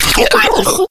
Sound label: death, slender shiver